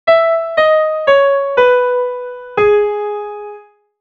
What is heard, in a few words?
Fanfare; Aerith